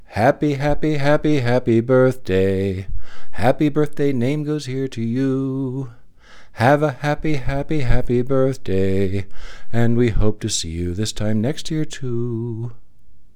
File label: Happy happy happy happy birthday; WFMU; The New Birthday Song Contest